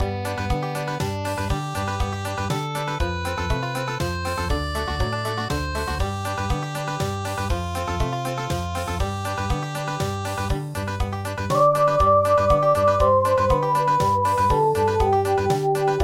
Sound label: Country; The Banks Of Claudy